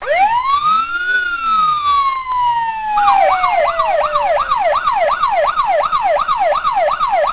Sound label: Police Mix, Ringtones